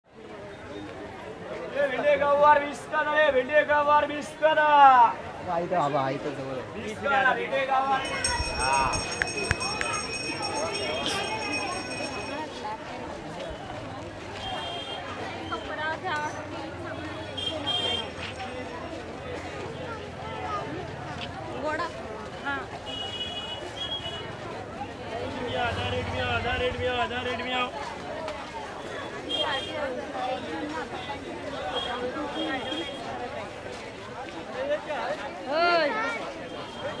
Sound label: Market ambience in Kerala India